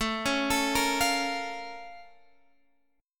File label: Bb Minor Major 7th